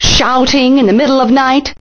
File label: shouting at night